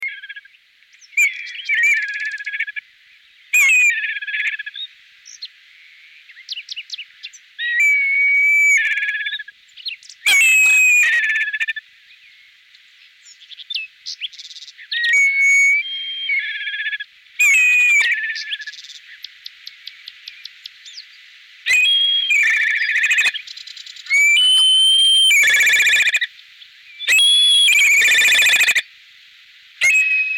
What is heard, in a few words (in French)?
milan noir milvus migrans; faune et flore; vertebres